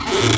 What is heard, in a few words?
Chest Open; Finding Nemo; XBOX